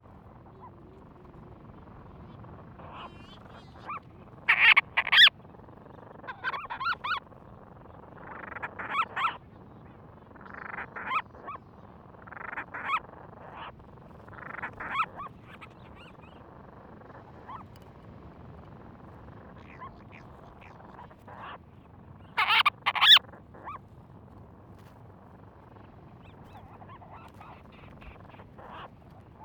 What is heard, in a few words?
Monteiros Storm Petrel